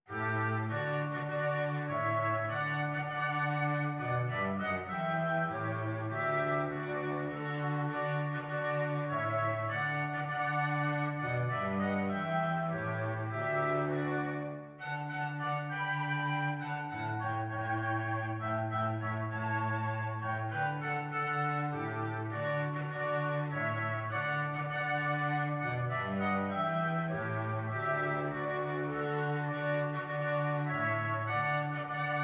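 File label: O Christmas Tree flute oboe cello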